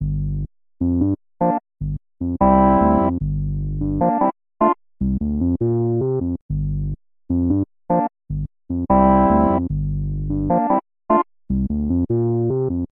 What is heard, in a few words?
moog prodigy bas; bassesland